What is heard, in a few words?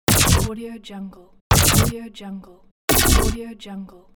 futuristic weapons